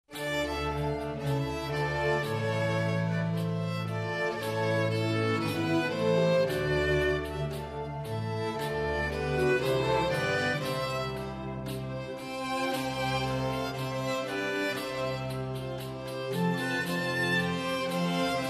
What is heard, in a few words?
pavane de spagine